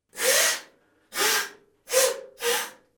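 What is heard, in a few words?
nose blowing sound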